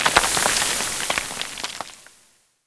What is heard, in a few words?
dirt slide; de byka